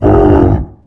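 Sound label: biomech air voice punch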